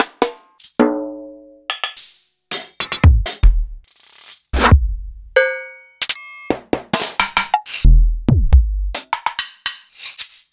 EMU Orbit

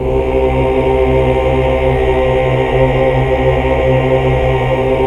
AAH 2 3 R; Best Service Gigapack 1

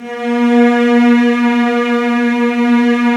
STR LUSH S, MIDI Section; String Master Series; Combos 2

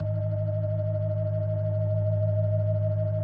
G#1F, B3, Vintage Keyboards

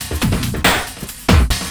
Breakdown Beat, Drum N Bass; Sonic Foundry (Sony Creative Software); Knutrix Drum & Bass

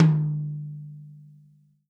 TOM, Real Drum Kit, FUNK KIT

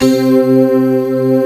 SYN D50, Roland; Sound & Vision - Gigapack I CD 2

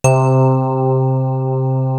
clear piano; East West - Global Sounds; S.I KEYS

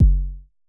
Basic Kick F#1, Dirty Kicks, Vermona Kick Lancet; LookIMadeAThing Kicks

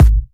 Bassdrum

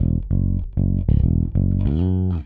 Rock, Bass; Bass X

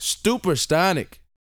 GOOFY, Vocal Planet R&B Dance SCI-FI; 5 SCI-FI CHZ

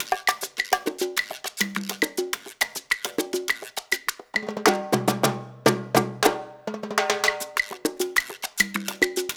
LOOP, Percussion

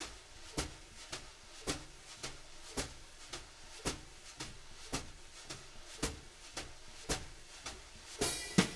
BR, BRUSH LOOPS; Sampleheads; Peter Erskine

